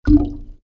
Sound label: liquid event